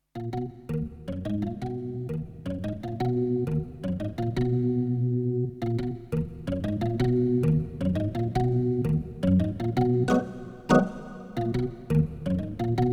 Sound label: Organ Verb